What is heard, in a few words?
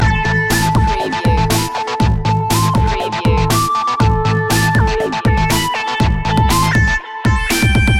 unnatural science loop